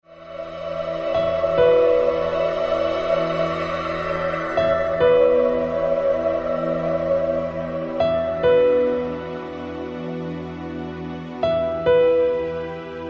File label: sounds of guitar